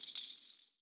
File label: Jingle Bells Rattle